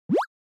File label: Anime Motion High